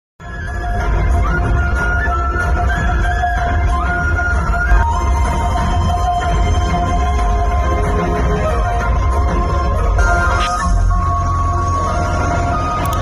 movie romantic bgm ringtone; virupaksha

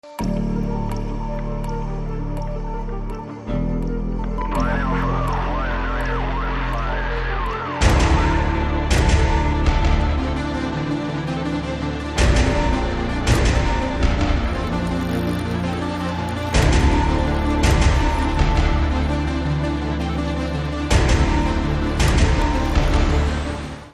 Telugu Motion Poster BGM; Sardar

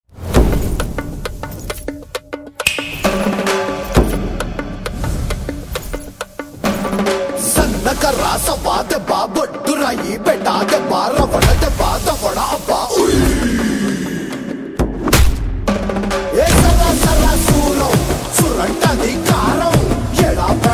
mass strike bgm; Guntur Karam; Mahesh Babu

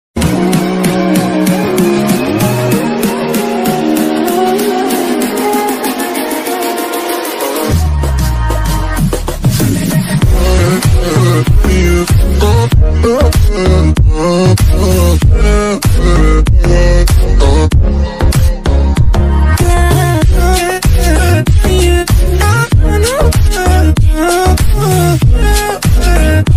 attitude ringtone; bad boy entry

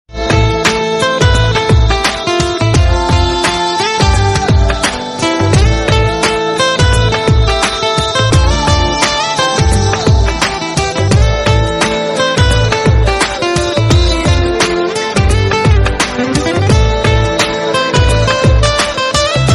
love bgm ringtone; arya

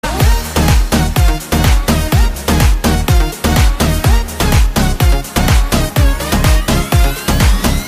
Dj Bgm; Ruler

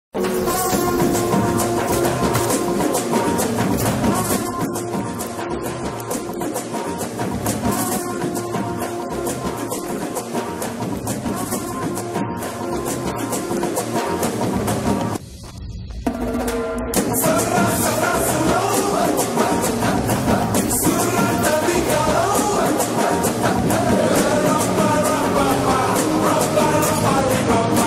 trailer bgm ringtone; Guntur Karam; Mahesh Babu

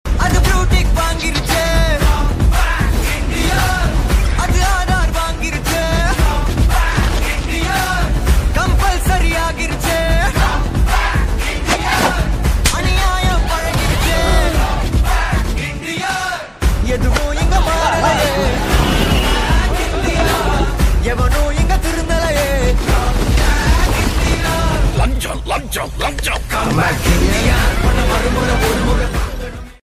intro bgm ringtone; indian 2; kamal haasan

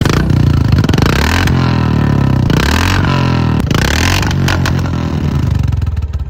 Bike Beat Ringtone; RRR; Bheem

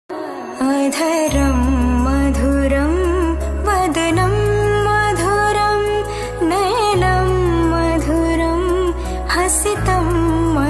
adharam madhuram ringtone bgm; krishna

